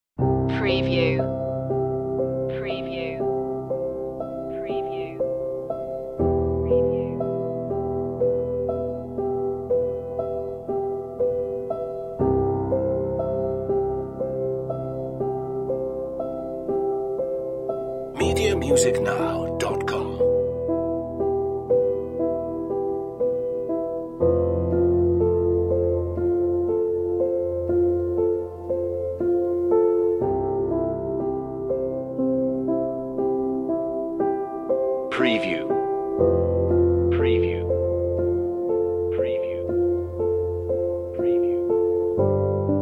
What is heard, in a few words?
flowing emotions piano only